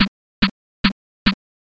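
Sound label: footsteps march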